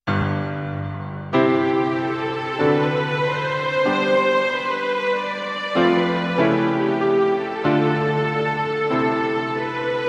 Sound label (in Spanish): Canto virtual; Birmingham; Cantata virtual. 05